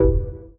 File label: Stab Dub Techno Emin